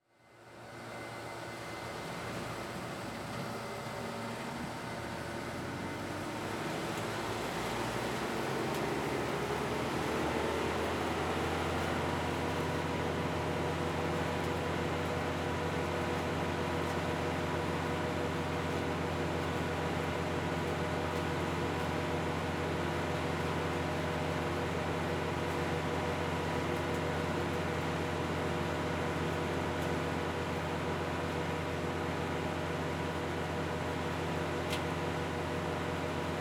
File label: Ambience Landscaping; AdobeCC